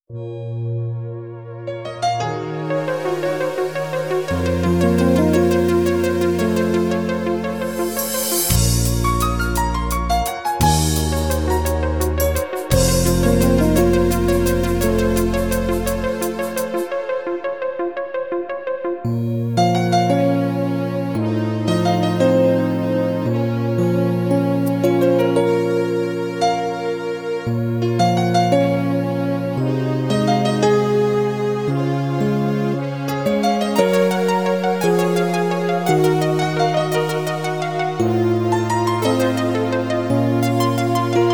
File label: brass minus